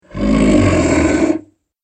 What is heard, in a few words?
Big Alien Bugger; Alien Sound Effects